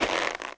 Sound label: snowball roll; sfx